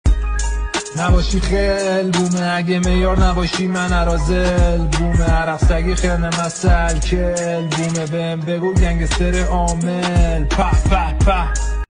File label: Rap